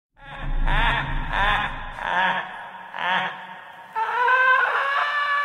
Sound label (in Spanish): risa; joker